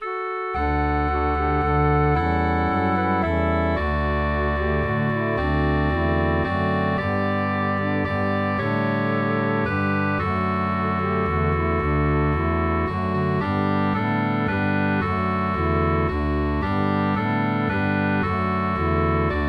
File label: Melody Harmony Bass